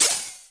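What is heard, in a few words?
WARP hi-hat open, effected drum; 90 sSampleCDs; Crypton - DrumBase5000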